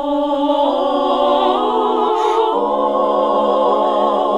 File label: SING, CLASSIC VOX1; Best Service; Hallelujah